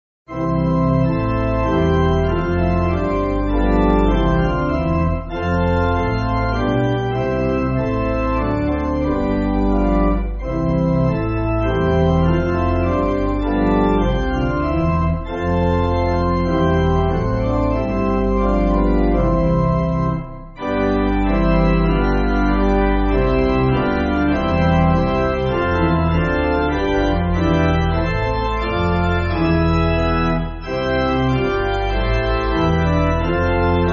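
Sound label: Pipe Organ, Backing Files for Churches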